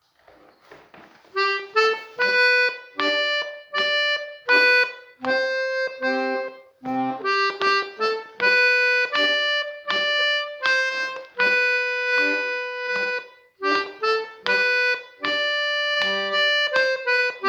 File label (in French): Apercu-Sol.-version lente